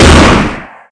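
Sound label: railgun fire, weapons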